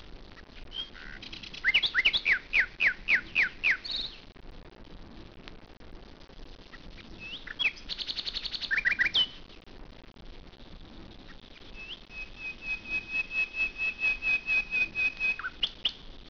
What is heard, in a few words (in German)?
Nachtigall